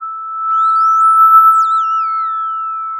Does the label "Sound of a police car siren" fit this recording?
Yes